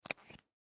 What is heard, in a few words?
Pen Put Lid On; Office; Blastwave